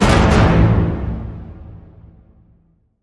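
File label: Hit Orchestra Tutti Double A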